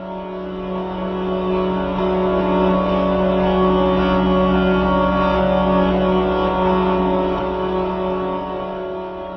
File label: swarming guitars pad; LOOPING AMBIENCE